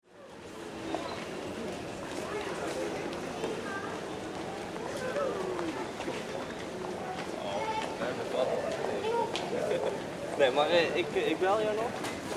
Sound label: Ambience Airport Denmark; Airports; Backgrounds